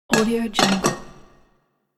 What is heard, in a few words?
palace plates moved on table